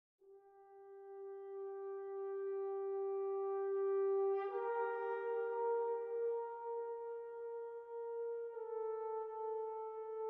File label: Horn 58bpm 4-4